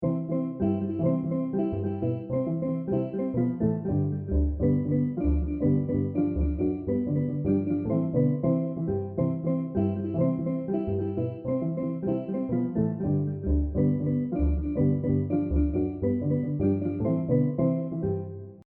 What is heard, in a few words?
Calypso Piano